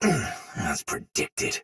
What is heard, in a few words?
block soldier sword agent male; Dialog; Barks; Battle; Melee; Sinecure